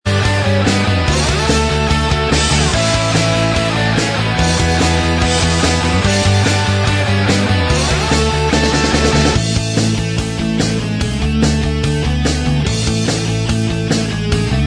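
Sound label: instrumental track, backing tracks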